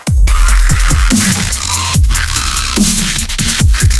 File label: musicgen dubstep